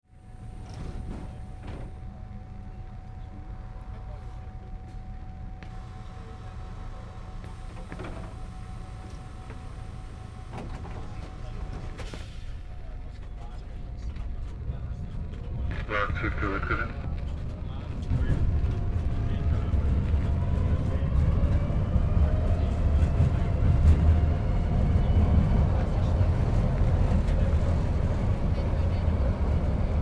tram, binaural recording of public transit in Budapest, Hungary